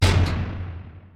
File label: Door Slam; National Security Press Kit